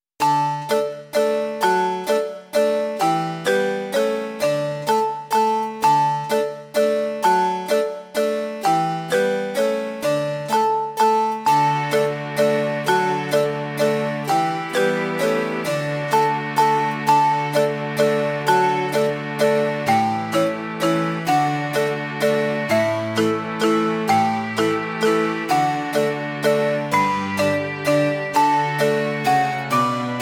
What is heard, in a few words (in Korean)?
String Ver.